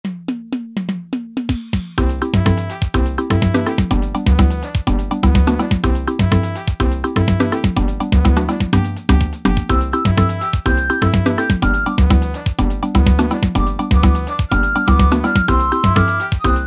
samba, Yamaha PSR-300 styles

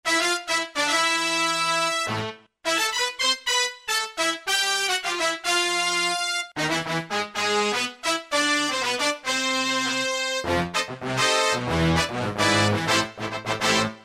Salsa Brass; Alesis Z7 Latin

